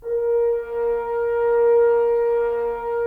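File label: Solo French Horn, Brass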